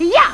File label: jump; mario; GAMECUBE; SUPER MARIO SUNSHINE